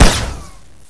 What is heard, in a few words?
New Fire; TheDanes UT Projects; AMLP JBrifle